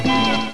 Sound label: Stab; Spanish Net